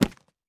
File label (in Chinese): 錾制书架取出书声